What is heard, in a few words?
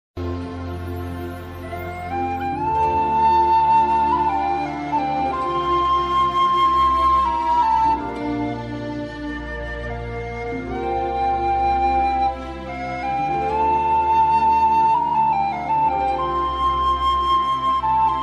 sad instrumental ringtone